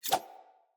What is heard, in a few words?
Dagger Whoosh; Sinecure Dagger